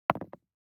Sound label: DICE on Neoprene, Throw and Roll, Standard, 1 One Die; Samples; ArticulatedSounds; DICE Sfx Party (Extended)